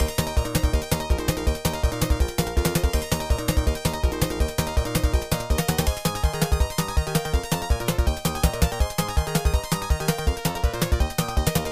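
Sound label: background music; Block Block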